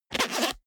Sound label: zipper small case open fast